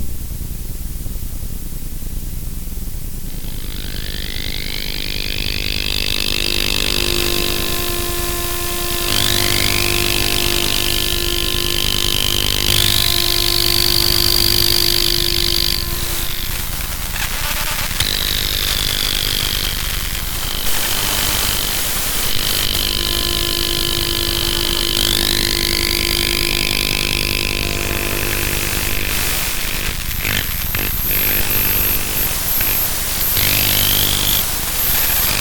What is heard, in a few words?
emf